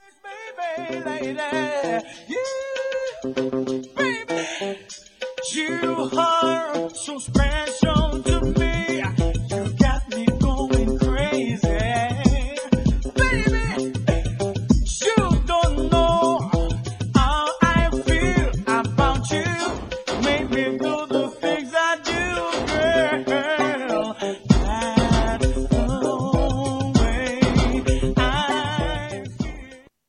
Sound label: ragga pitch